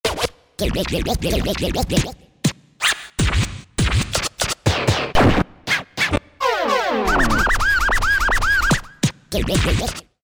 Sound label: Scratches